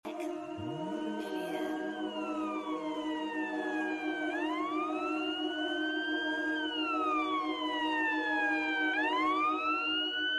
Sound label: trance siren ringtone